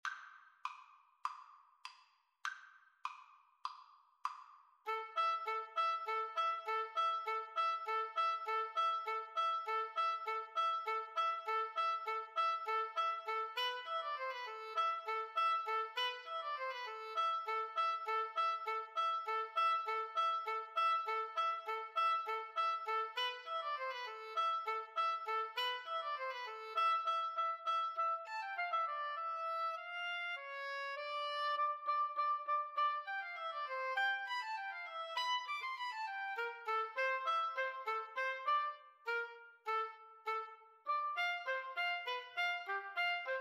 danses des cygnets, 2 oboe

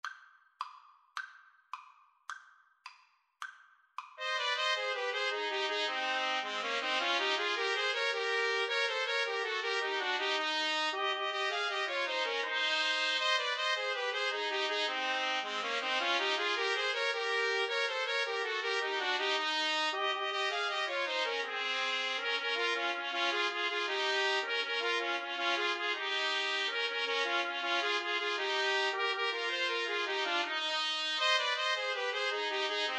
3TPT, Trumpet Trio

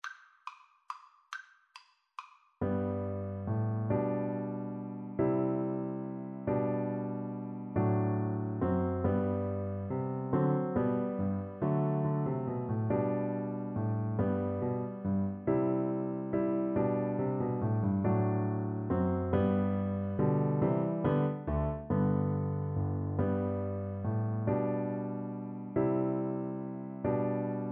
Piano Duet

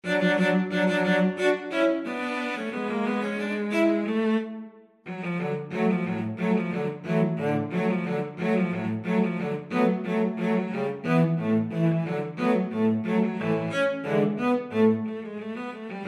cello quartet

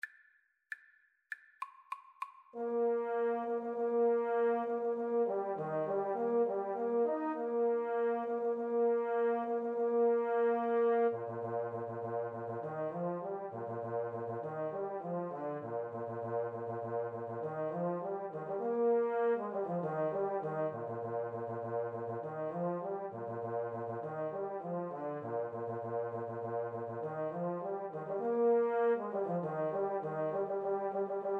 Tuba Duet

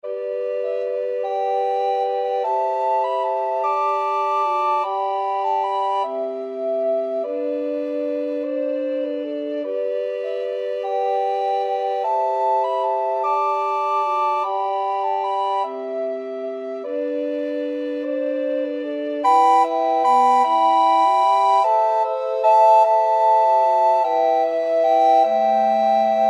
recorder quartet

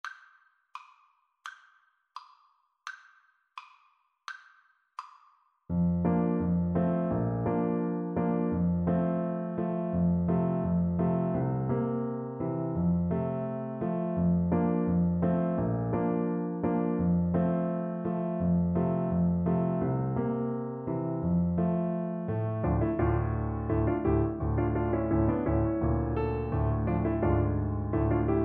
Oboe; brahms hungarian dance 3

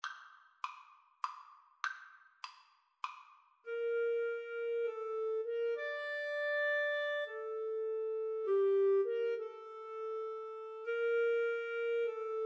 suo gan, Flute-Clarinet Duet

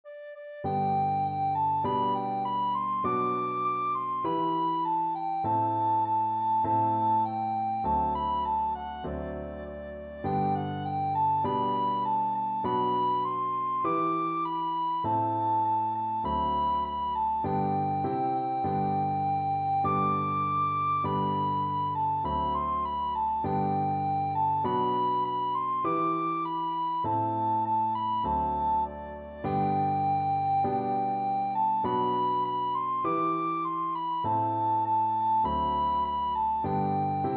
recorder

